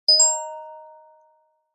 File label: multimedia alert bell chime notification metallic high pitched